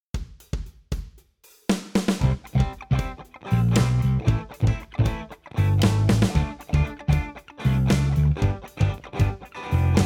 65 Deluxe Reverb

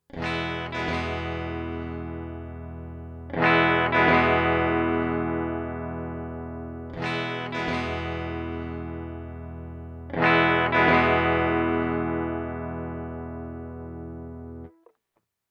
Guitar

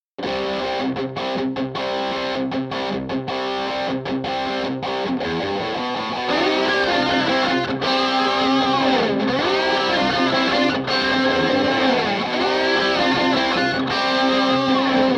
Initial Lead; Archon

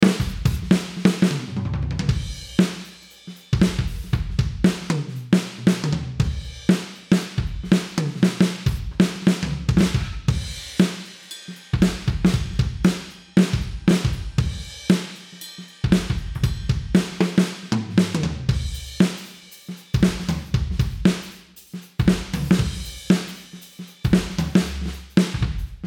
Heavy OH And Room

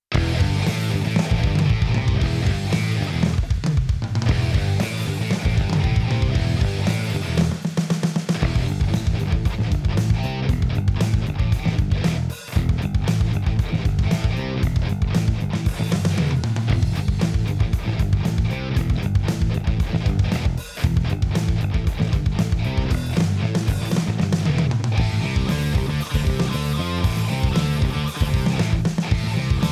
riff

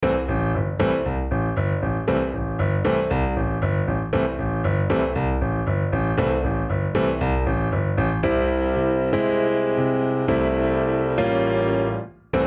Piano Comp Test

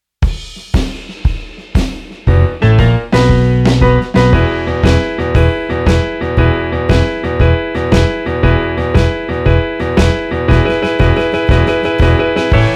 mono E Major Blues Short

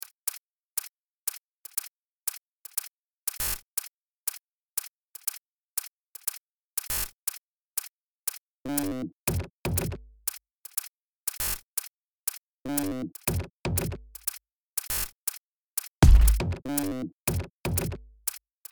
Dubstep Sludge Rocket

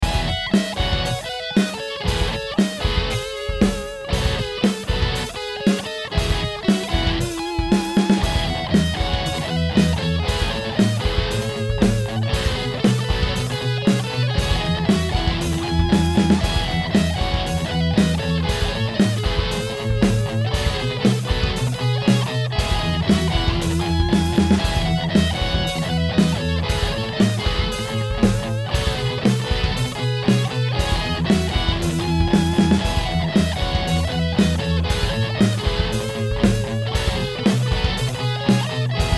Crunch 2x12 Celestion

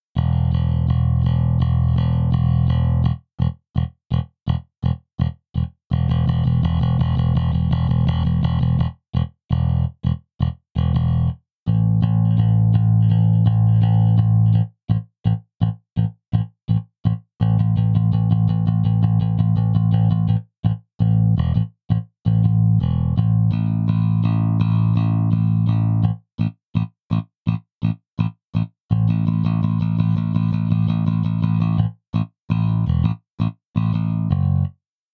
Modern J Bass Defoult setting Pick

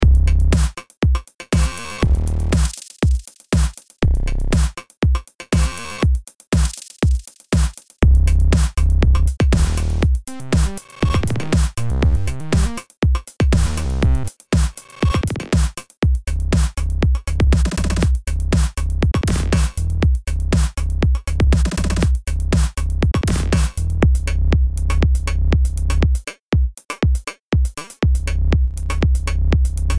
Electro Stab

